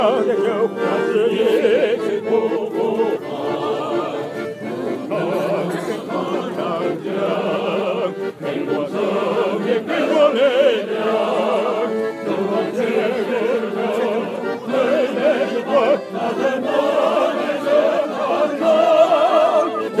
chant communiste chinois

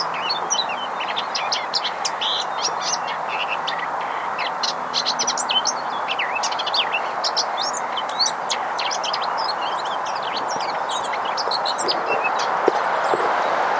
fauvette tete noire etang

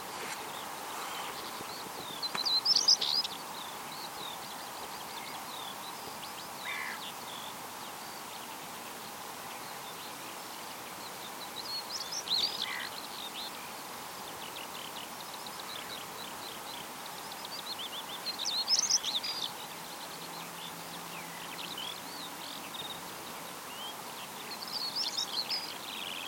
alouette tarier gambette; fresnes

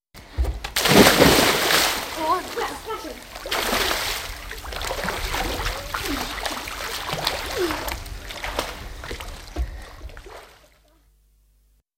plongeon

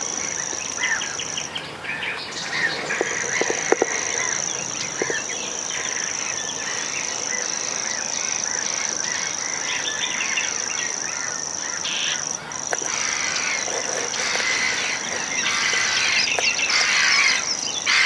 locustelle tachetée

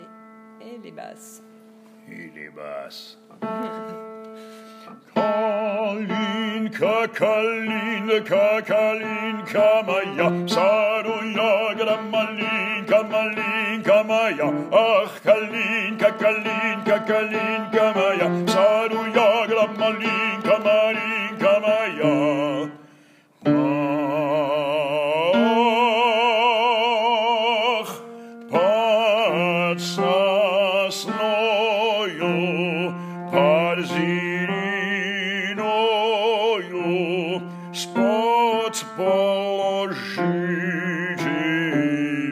basse

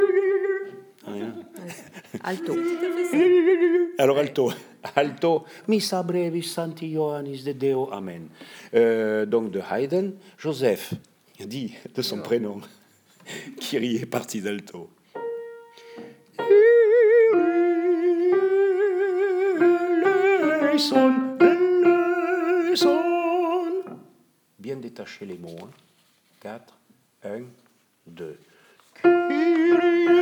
alto

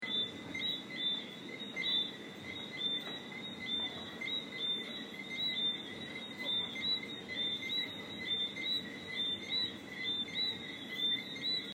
insectes; grenada

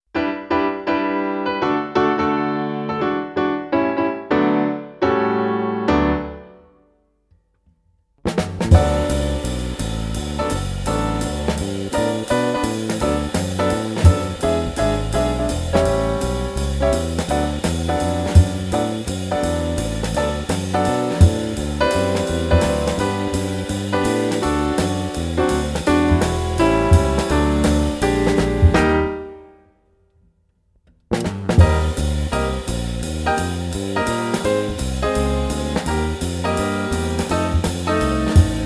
swing bells aigu

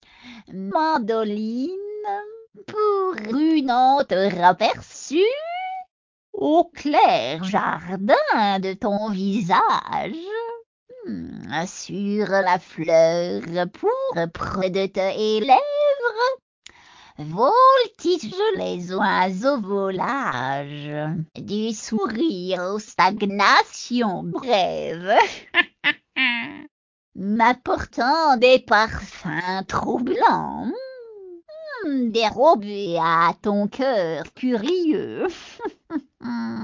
mandoline

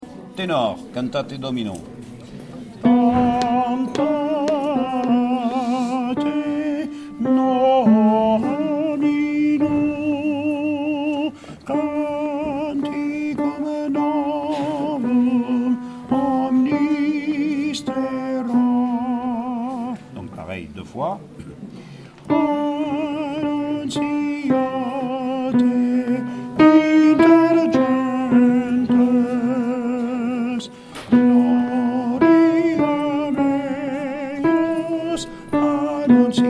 tenor; cantate domino

